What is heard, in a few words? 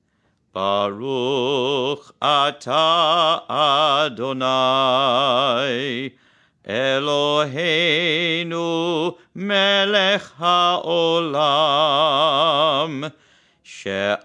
Candle Bless Chanukah Chant; mitkadem hebrew for youth; ramah